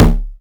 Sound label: dx tom; Roland MV8800; MACHINE KIT1